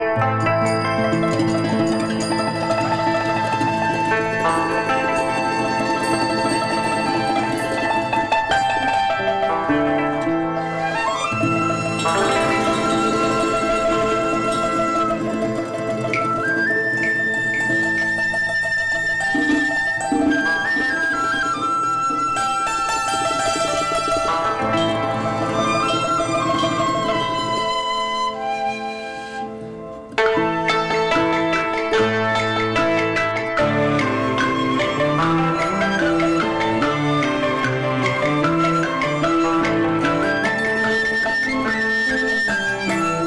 TRADITIONAL Chinese, Picking Flowers

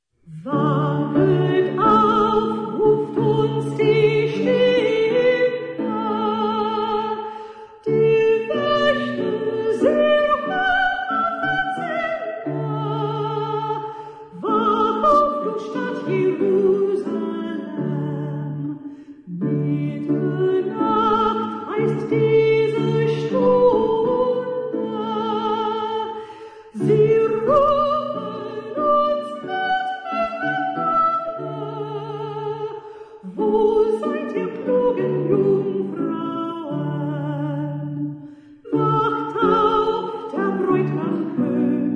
lute; Wachet auf, ruft uns die Stimme

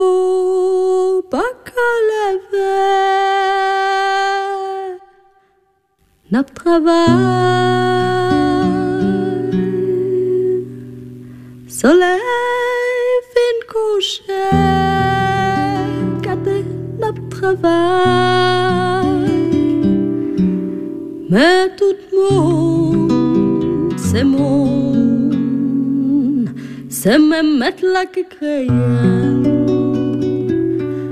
Guitar, double bass; from Haiti

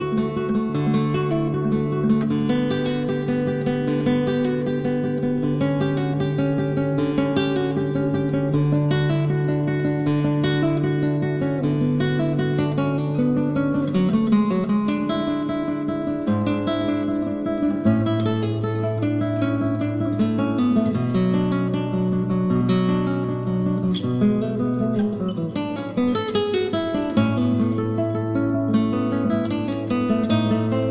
Cello Suite No. 1- Prelude

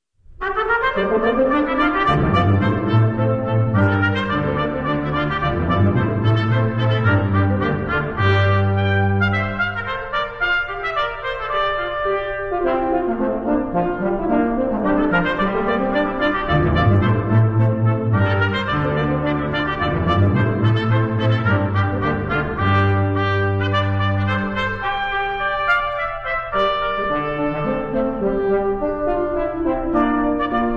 Brass Quintet, French chanson; Il est bel et bon

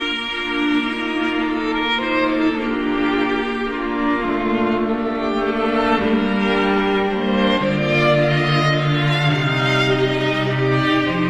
Barcarolle for string quartet

